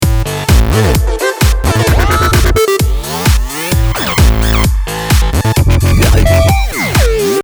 Electrohouse mix

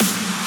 EDMS FX SNARE

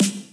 EDMS SNARE

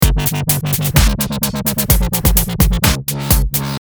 dubstep kick bass

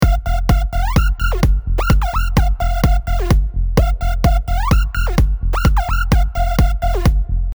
EDM SYNTHE

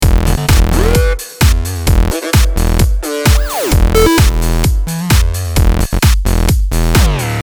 ELECTRO HOUSE MIX

